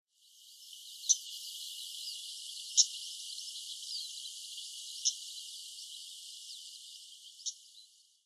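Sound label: jobitaki, Daurian Redstart; hyogo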